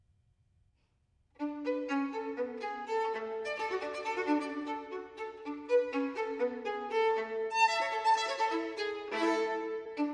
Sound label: Fantasie for Violin Solo in E Flat Major Presto; Georg Philip Telemann